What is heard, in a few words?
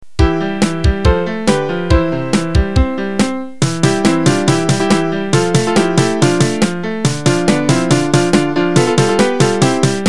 Milk Song melody